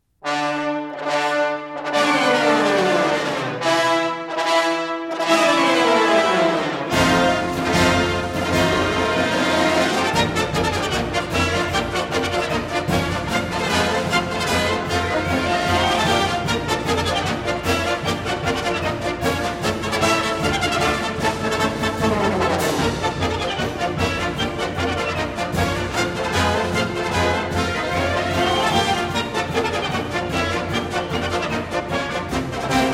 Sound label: Thunder and Blazes, Wind Ensembles; Fucik; Eastman Wind Ensemble; Screamers